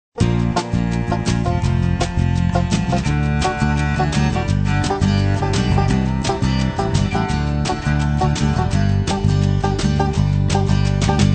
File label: Bluegrass